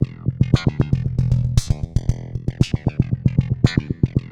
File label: WAH FUNK, ARPEGGIOS; ILIO; Trance Fusion